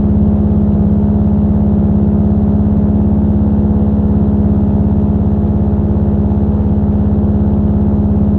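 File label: SFX - airplane propeller; Vliegtuig Propeller1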